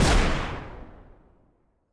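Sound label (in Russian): выстрел вдаль из гаубицы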